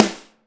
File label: AMBSN, ST AMB SNARE